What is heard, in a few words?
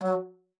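F#3, bass flute; Xsample VOL-2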